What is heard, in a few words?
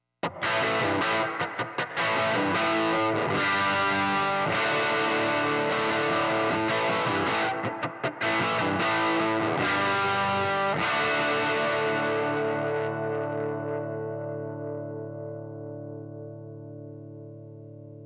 Gibson Les Paul Vibro Champ Reverb volume set to 7